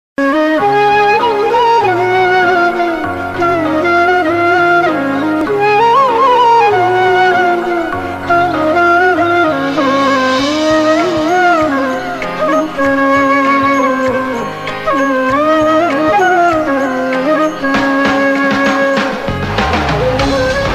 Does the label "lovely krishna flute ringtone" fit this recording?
Yes